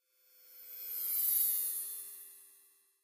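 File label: Electric Buzz By Pass; buzz & hums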